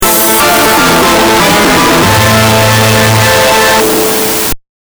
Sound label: Epic, stingers